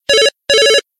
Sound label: official ring; ringtones